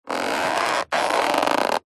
foley cardboard condensed thick squeeze crush squeak; zapsplat